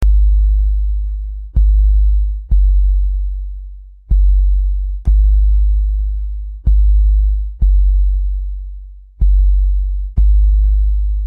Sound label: Bass Woofer